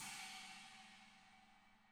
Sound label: CHINA 20, CHINA CRASH; ILIO; Double Platinum Drums 2